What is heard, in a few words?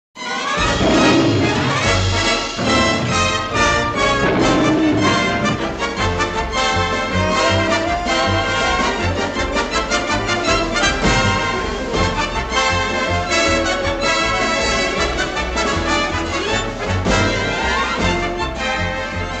theme tune